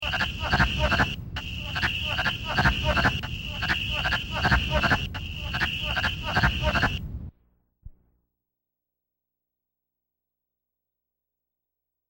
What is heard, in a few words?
Wood Frog; wildlife